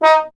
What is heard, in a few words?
trombone; Miroslav Vitous; Solo Instruments VOL-1